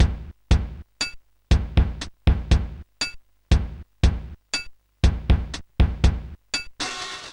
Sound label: Kit Five, Sonic Drum Kit